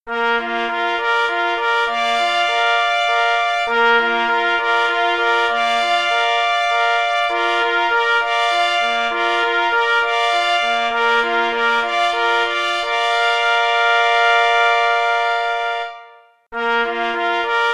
Hejnal sklad jednorodny

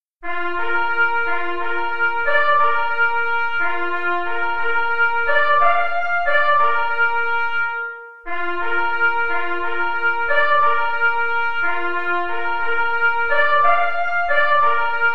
Hejnal; KL w Zoledowie

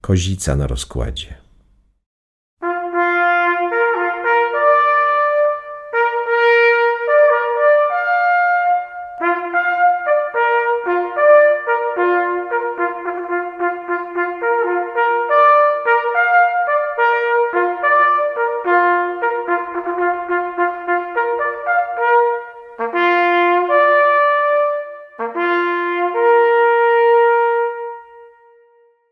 kozica na rozkladzie solo

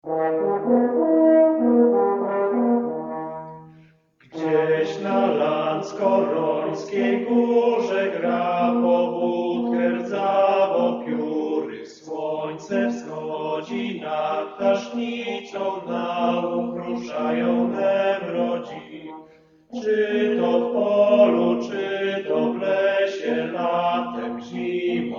kwartet hymn; lanckorony

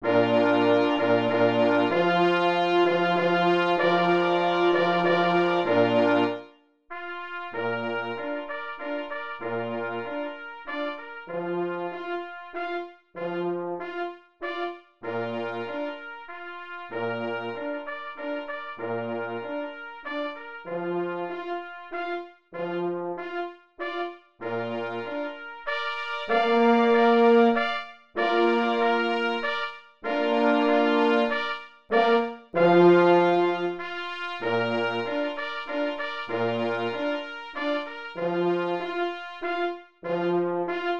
Menuet instrumenty mieszane

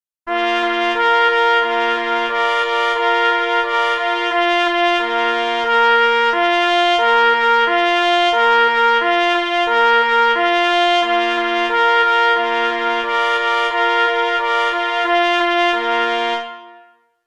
Hejnal, z.jednorodny; XIX OFMM Czluchow 2014

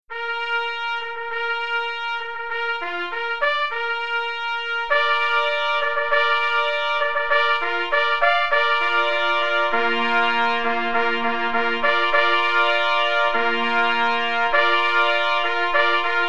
Fanfara na podniesienie

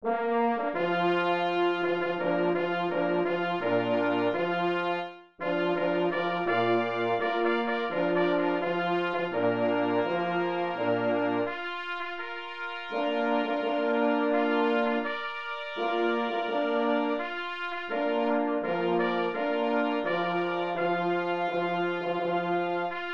Hejnal instrumenty mieszane

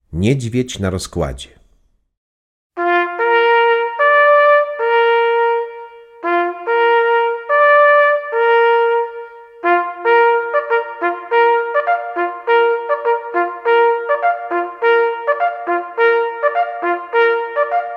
niedzwiedz na rozkladzie solo

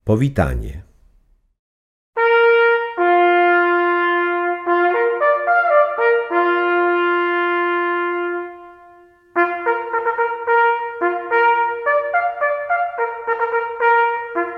powitanie solo

